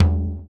surdo; ILIO; Ethno Techno

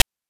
PITCHED NOISE; NES DPCM; MIDINES

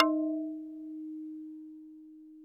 D#3, Yamaha VP-1; Music Store; 90's Sample CDs; MIYABI

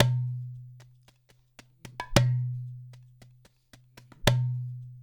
LOOP 7 R, Percussion; InVision Interactive; GRUNT

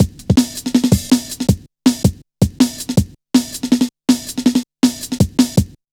drum loop, 161.5; Zero-G Jungle Warfare 1, 2 & 3

